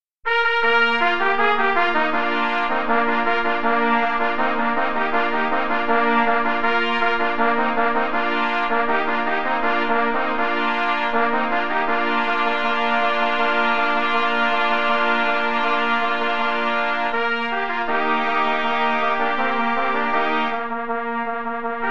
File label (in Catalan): TOC DE CASTELLS TRIO TROMPETA